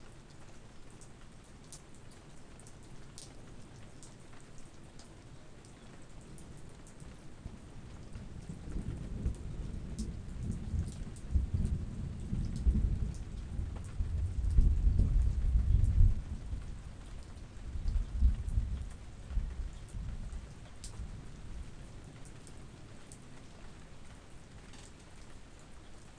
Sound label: outdoors; outdoors pack